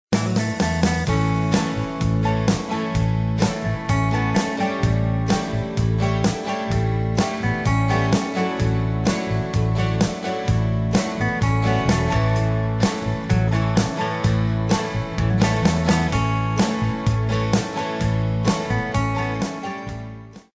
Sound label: country